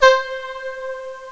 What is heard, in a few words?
VIOLA